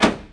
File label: SWITCH; UrbanChaos